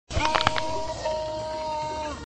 ahhhh

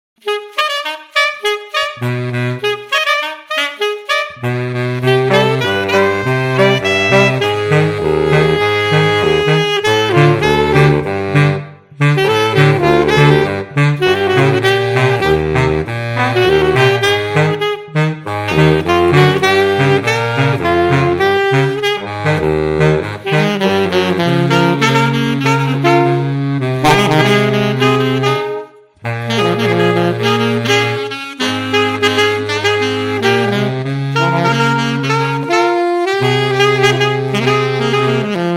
sax

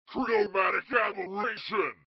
chromatic

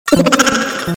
laugh

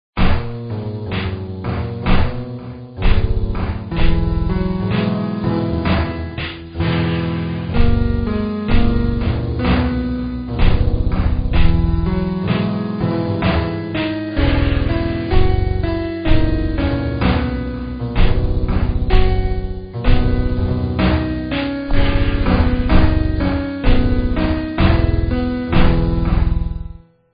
right backing; vapesquared

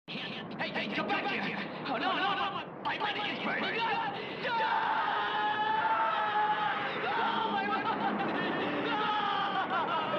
burning money; cave

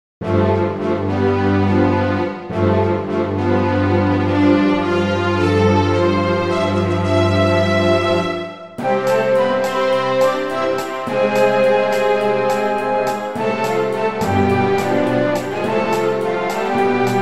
orchestral

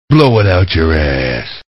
ass blow

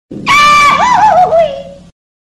goofy yell

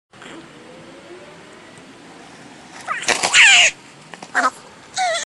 fat jump

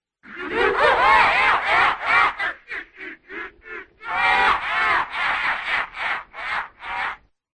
laugh

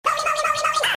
rolling

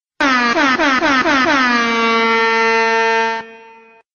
airhorn

